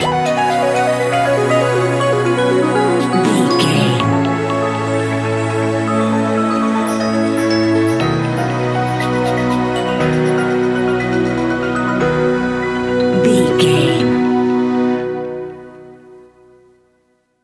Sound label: magical video game level bumper